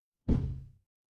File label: impact thud